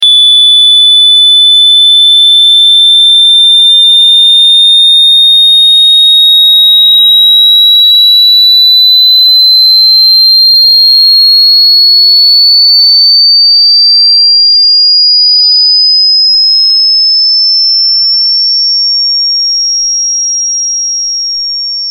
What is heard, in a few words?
Very High Pitch